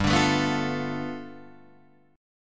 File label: G 9th, Guitar Open D